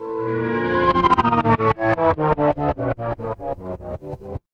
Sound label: magic scifi teleport